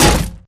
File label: bullet heavyballistic titan; Titanfall 2